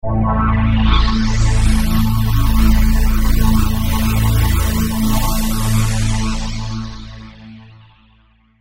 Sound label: pad